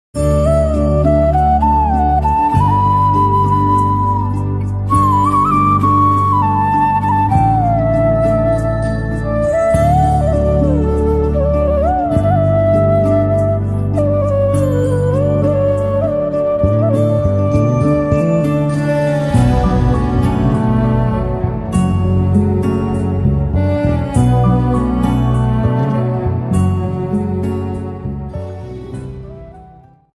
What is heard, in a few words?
Enchanting Flute Ringtone